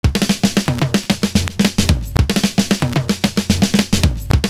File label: LONG FILL, loop; Univers Sons - Kitch 70s